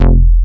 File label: bass classic mids F; Polyend; ARC Noise